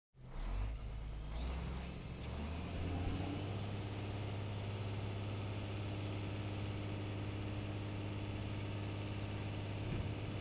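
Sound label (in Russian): Самосвал разгружается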